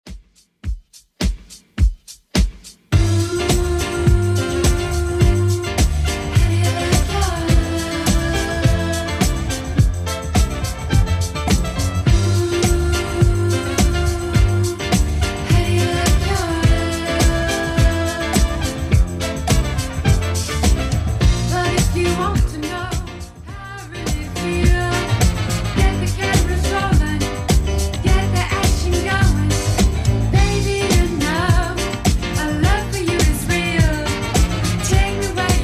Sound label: 70s Redrum 105bpm